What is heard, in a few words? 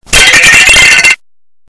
lego breaking sound effect loudest